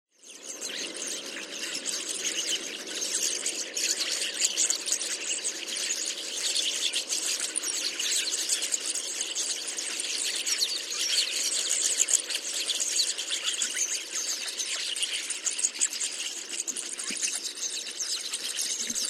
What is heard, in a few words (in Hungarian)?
pásztormadár Pastor roseus